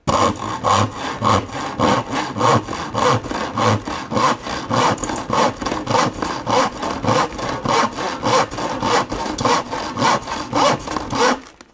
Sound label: sawing, mechanical sound effects